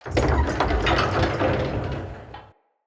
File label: Archway Gate Door Open; UnderCity